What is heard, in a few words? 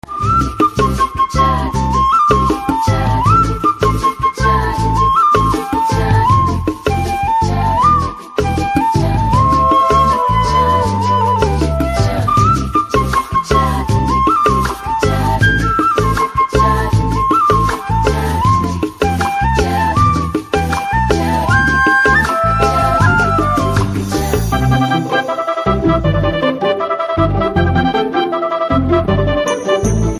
BGM Telugu Ringtone; Uppena